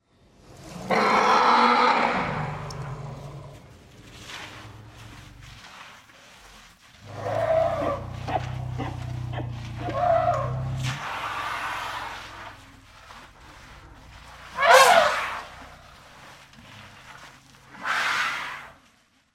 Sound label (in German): Afrikanischer Elefant; afrika tiere voegel und geraeusche